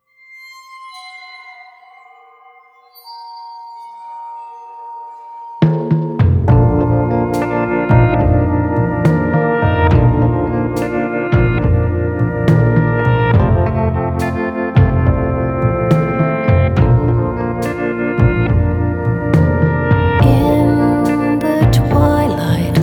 roots mallets natural, drums

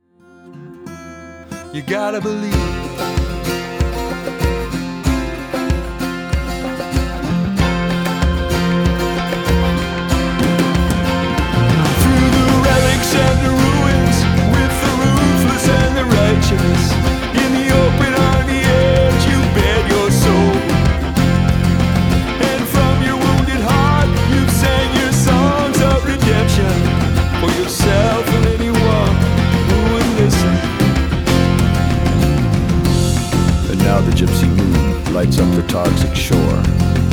rock big open toms, drums

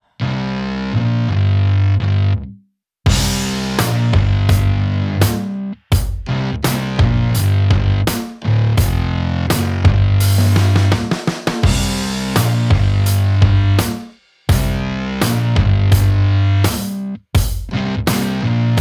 rock tight gnarly, drums

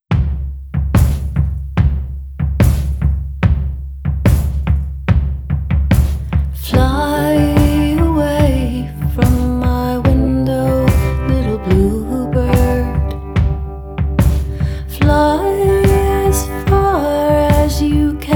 roots mallets natural room, drums

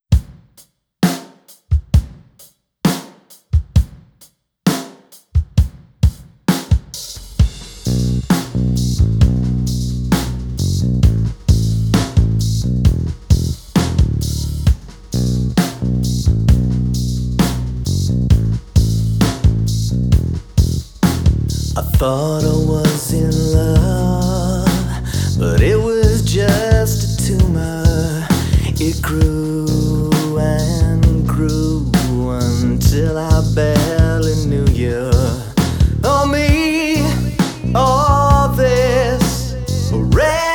rock pop natural

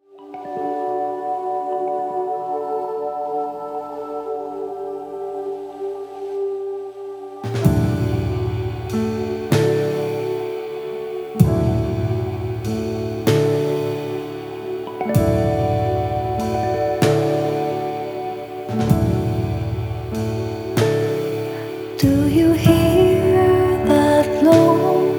ballad open loose vibey, drums

